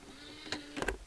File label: disc clamp, BDR-211JBK